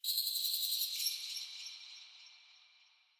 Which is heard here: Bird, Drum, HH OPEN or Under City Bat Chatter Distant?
Under City Bat Chatter Distant